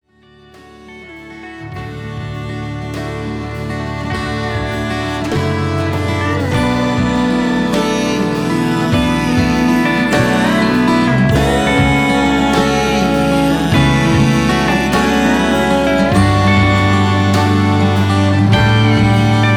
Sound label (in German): melodie